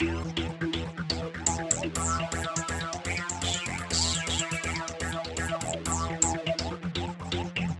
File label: Dance Pulsing Synth Emaj; Dance DJ; Digidesign; Pro Tools Essential Loops